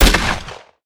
GUN

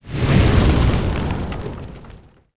Action Large

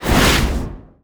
BackStep

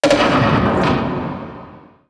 open

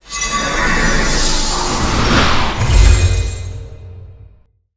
Summoner Multi Totem

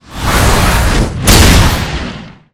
Stunshot; Ranger

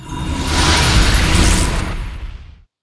Sword Master

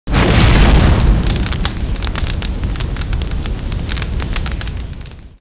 Death of Earth

